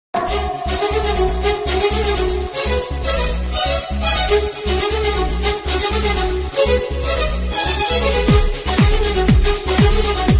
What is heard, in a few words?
song bgm ringtone; pokiri